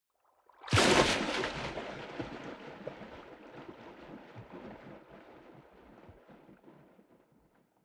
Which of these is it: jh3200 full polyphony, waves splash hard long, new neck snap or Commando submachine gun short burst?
waves splash hard long